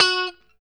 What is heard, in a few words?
POP F#4, STRAT guitar; Spectrasonics; Hans Zimmer Guitars VOL-1; JC-STRAT POP